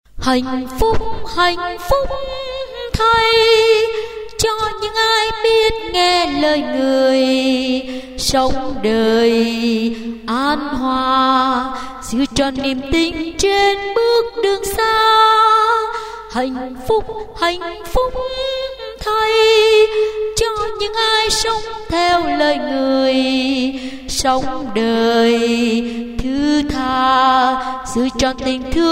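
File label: soprano alto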